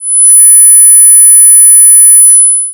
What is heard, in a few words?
10khz